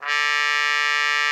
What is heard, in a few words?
CUP MUTE D3; Xsample VOL-3